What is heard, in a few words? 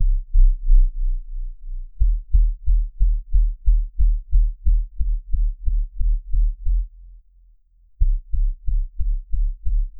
sonic, modern